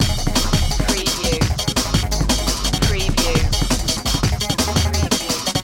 drum n bass loop

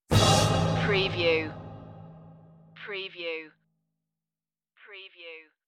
voices on the wind stinger

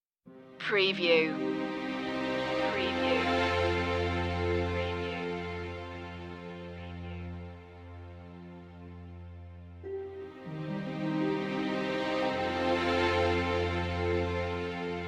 magic forest no brass

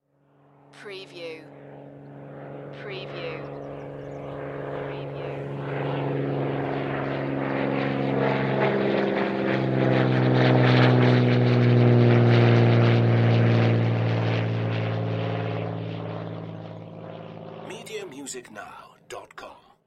Airplane Prop Plane Fly By

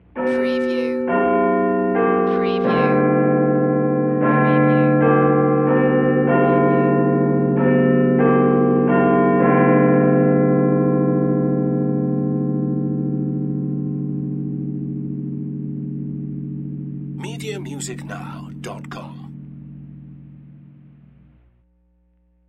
Big Ben Three Quarter Hour

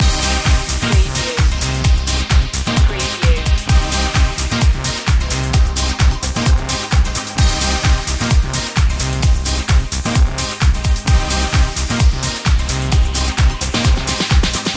plasma life loop

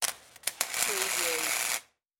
Arc Welder

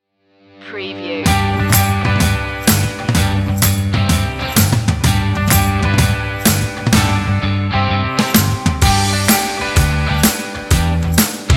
no vocals short

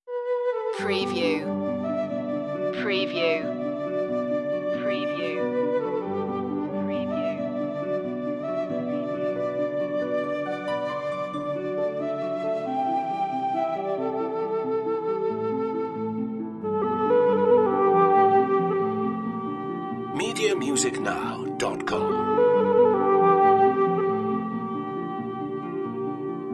flute harp piano

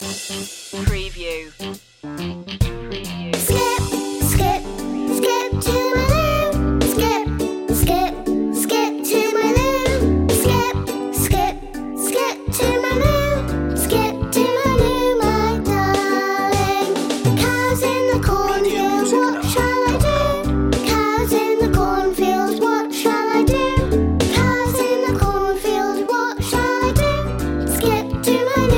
skip to my lou children vocals

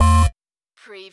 Servo Panel Sound - Denied

